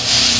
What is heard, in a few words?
short Zap; POOL CHAMP